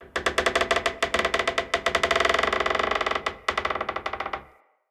Dendrit Structure Wood Creak Small, Ambience, Fort